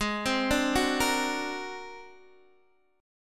Gsharp 9th Flat 5th Gsharp Guitar Open D; x,11,10,8,9,8; down; chord shape

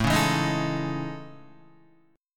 A Major Sharp 11th, Guitar; AM#11 strummed

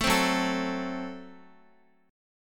Gb Diminished Guitar; strummed; JGuitar

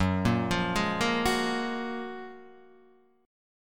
Gb Major 9th, down, Guitar, DADGAD

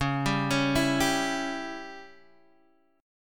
Db Diminished Db Guitar Open D

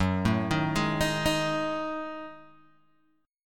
F sharp Minor 6th Add 9th, Guitar, Open G

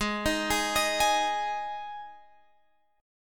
G sharp 5th G sharp Guitar Drop D

